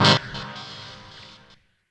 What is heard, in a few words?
CHORD, Drum & Bass 175 BPM; Ueberschall; Drum & Bass Flash Trackz